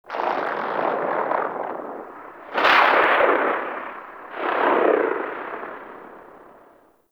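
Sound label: Distant Air Rip, Exterior, Gas City; Warframe; Corpus Gas City; Ambience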